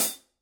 formula 602 classic sounds 14 medium hi hat closed